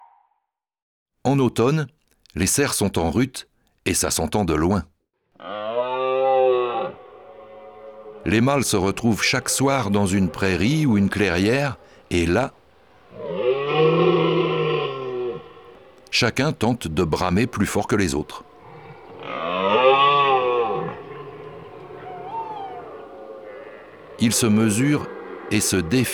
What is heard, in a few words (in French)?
Cerf; ABC de la nature